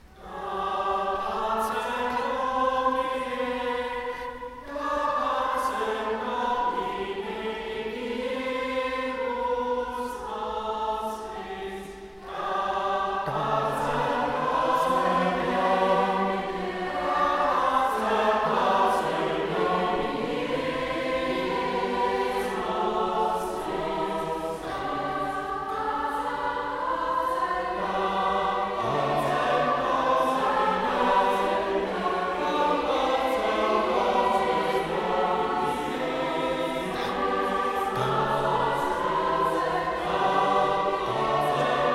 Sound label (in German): Chor und Jubilaeumschor, Da pacem Domine; Konzertmitschnitt vom 19. Dezember 2023